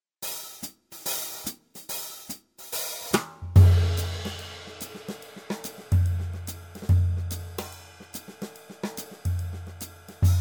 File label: jazz funk drums